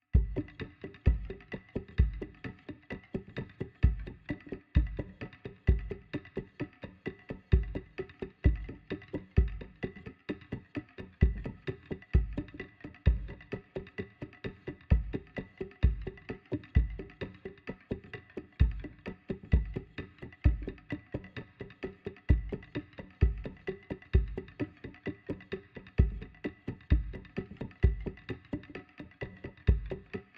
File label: Forest Music Perc